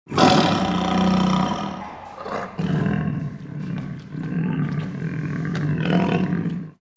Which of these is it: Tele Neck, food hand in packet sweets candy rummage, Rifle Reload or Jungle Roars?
Jungle Roars